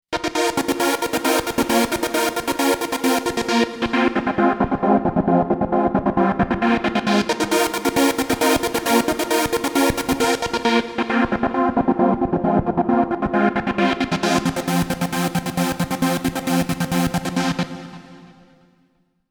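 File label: sliced bass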